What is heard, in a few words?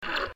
sound hurt